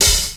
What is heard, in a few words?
raw open hi hat one shot a sharp key